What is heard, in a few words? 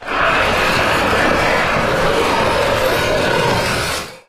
arm pathetic scrape; Source Engine; Portal 2